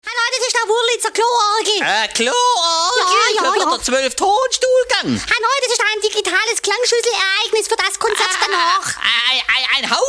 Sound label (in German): Klo Orgel